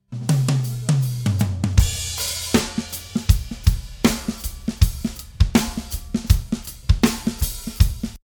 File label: Rock Drum Bus Dry